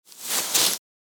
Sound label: Polyester movement scraping; foley